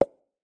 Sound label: pop; CrossyRoad